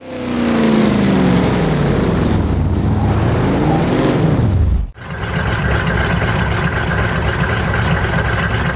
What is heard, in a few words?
throttle off, vehicles cars; chev cucv; lwcars